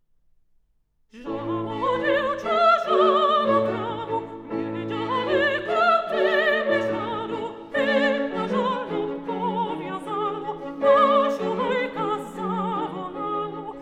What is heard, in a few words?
In Folk Tone; Op. 73, B. 146- No. 2, Žalo dievča, žalo trávu